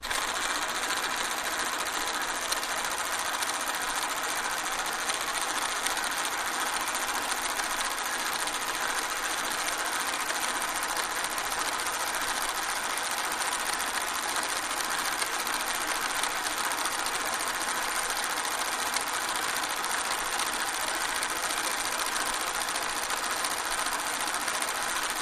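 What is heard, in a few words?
Motor Small Spring, shutters & movement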